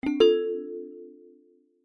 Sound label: multimedia notification bright metal tuned mallet generic; zapsplat